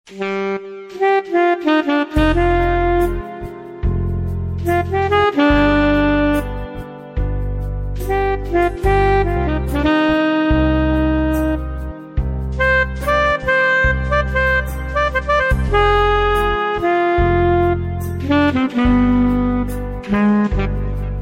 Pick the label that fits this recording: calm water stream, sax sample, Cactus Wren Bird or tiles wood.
sax sample